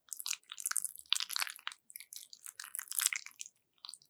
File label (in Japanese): 挿入